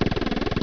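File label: machine gun; LEDWARS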